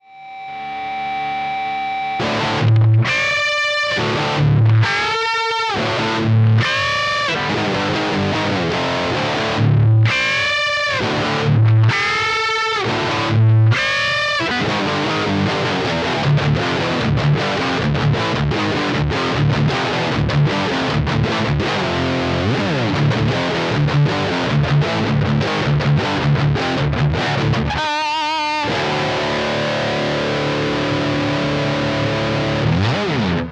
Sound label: higain mid